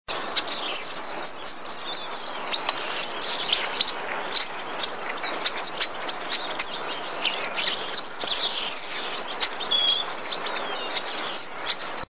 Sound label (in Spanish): voz varillero congo